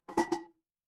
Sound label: glass ceramic